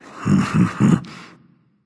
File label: heh heh, voice; Smite; ymir skin14